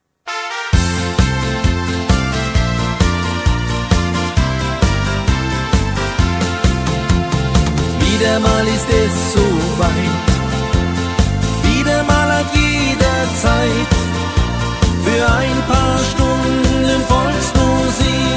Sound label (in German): Volksmusik fade